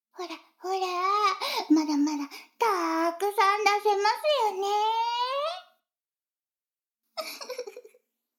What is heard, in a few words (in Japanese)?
シコシコ音声; 逆時間停止されて